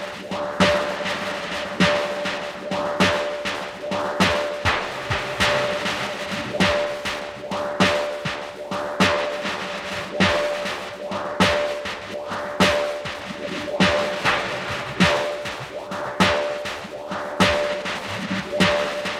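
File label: 100BPM D minor Snare Pattern; BRM Vol2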